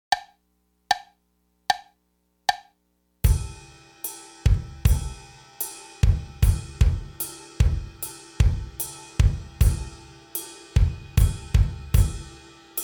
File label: quarter ride, drums